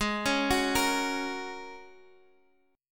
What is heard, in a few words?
G sharp 7th Suspended 2nd Suspended 4th, guitar